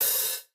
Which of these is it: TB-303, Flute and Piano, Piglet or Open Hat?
Open Hat